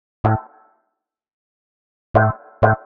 126 A MUSIC; funky; Loopmasters; Funky Underground Vol 2

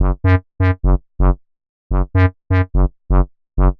126 F# BASS, Funky Underground bass loops; Loopmasters; Funky Underground Vol 2

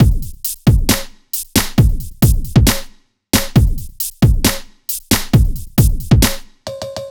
Bpm135 Walking Break, dubstep drum loops

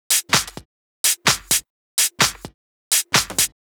Loop, 128 BPM

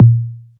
Toms

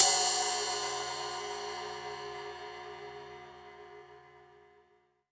Ride, Cymbals

